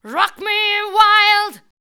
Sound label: ROCK ME, Voice Spectral